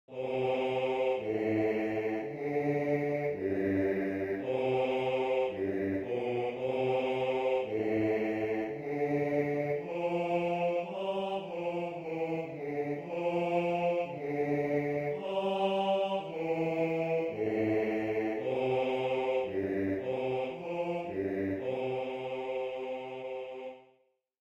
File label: Game Ending Song - Bass